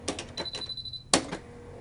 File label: typewriter beep